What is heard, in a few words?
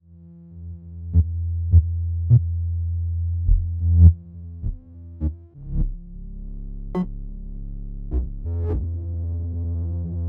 Swingbass